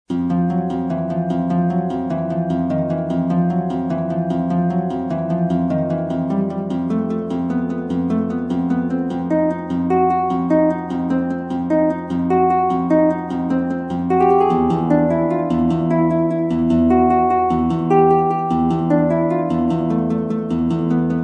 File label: battle, lute; nero